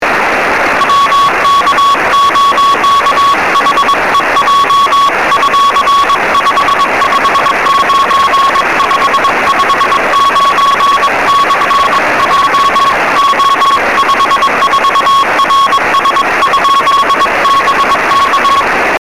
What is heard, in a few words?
23cm no qso; sm7lcb